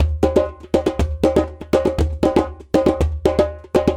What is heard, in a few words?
Djembe Support Rhythm; recorded by Christopher Logan Austin